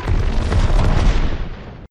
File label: beam end effect